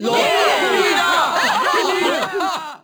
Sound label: foul, Crowd German